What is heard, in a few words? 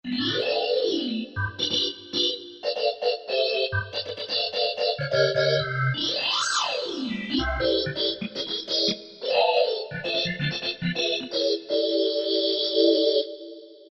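Organ Slide B3, Yamaha EX5R; Factory presets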